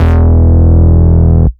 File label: Root E, Bass